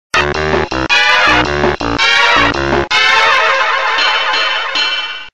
Fail a Race, Music; Banjo-Pilot

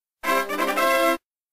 Victory; Banjo Kazooie Grunty s Revenge

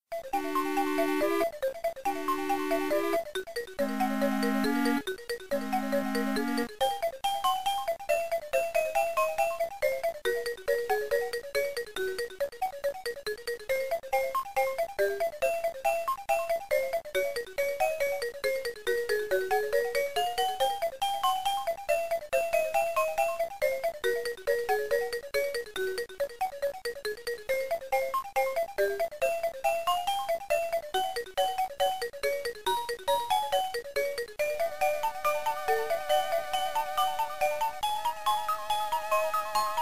Underwater; Banjo Kazooie Grunty s Revenge

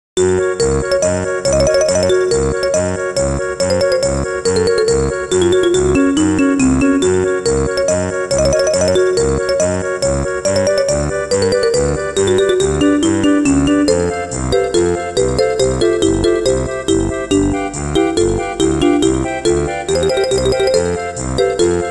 Happy; Boggys Igloo; Banjo-Kazooie

